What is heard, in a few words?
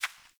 Hare Footstep, Foley; Animals; Ambient